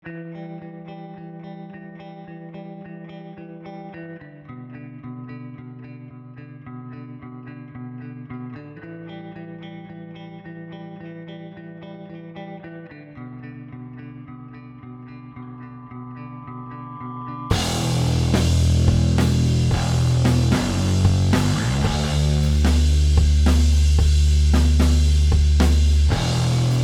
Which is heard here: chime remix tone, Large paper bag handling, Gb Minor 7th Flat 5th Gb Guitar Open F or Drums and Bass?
Drums and Bass